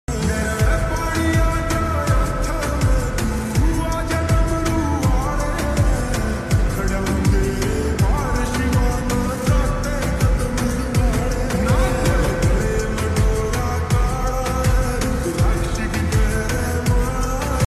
lofi ringtone lofi music mahadev ringtone lofi remix